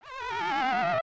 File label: Falling